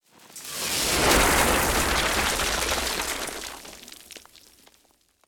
bomb material ground; War Thunder

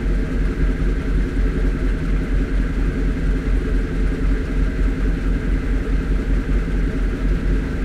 m5a engine int rpm 00, engines tanks; War Thunder

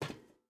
footstep rubber boots metal walk; Rust

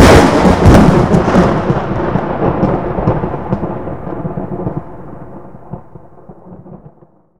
thunder strike

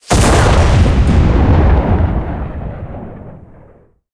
cannon 115mm u5ts shot, cannons tanks; War Thunder

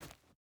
footstep boots forest jump start; Rust

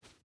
footstep boots sand jump start; Rust

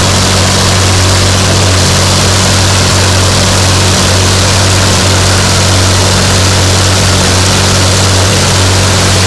Aircrafts Engines Jumo213A; DCS

